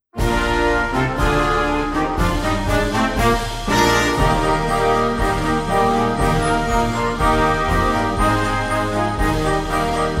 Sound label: Jingle Wish March, band